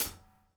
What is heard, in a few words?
DOWN, DOWN KIT 78